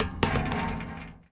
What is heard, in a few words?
Street Ball Metal Back, Street Basketball; SFX Kit